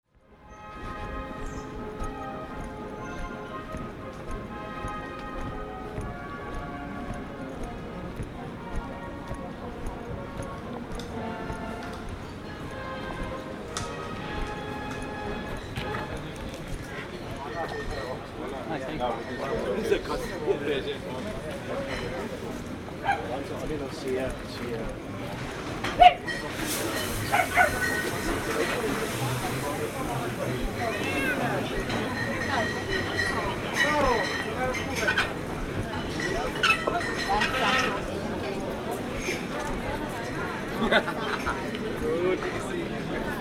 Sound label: Englischergarten